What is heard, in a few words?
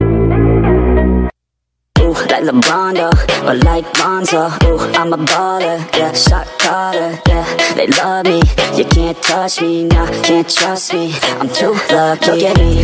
rock